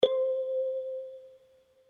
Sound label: Keys One Shot